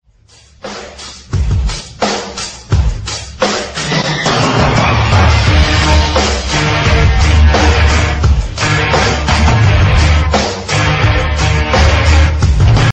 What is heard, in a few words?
instrumental